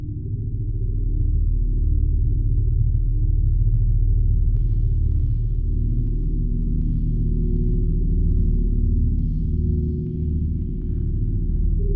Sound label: flag; airsoft